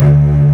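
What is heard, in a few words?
Kurzweil G2, strings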